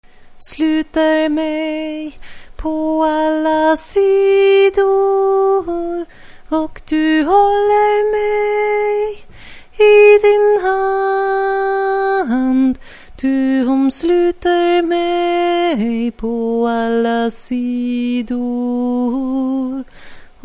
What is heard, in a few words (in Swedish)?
alla sidor bas